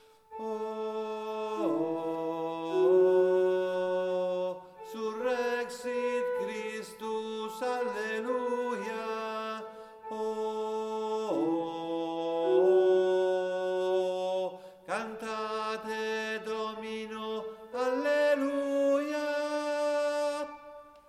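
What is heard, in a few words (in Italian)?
Surrexit Christus, tenori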